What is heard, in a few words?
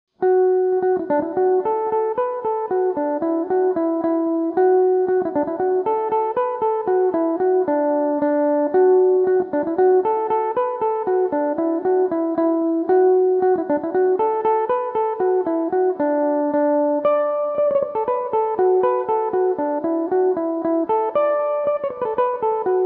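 polka D major, Irish mandolin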